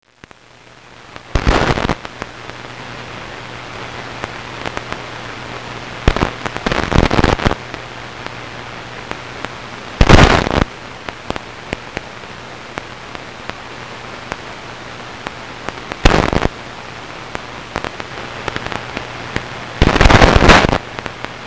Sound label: sferics on am radio; Natural VLF Radio Emissions